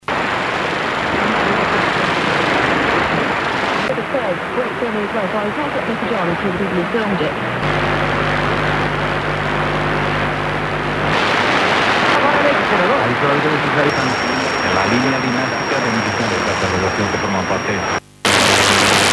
automatic volume level control, germanium receptor; sounds